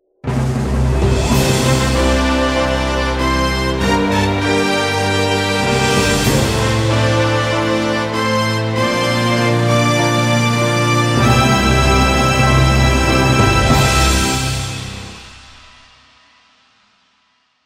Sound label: Fanfare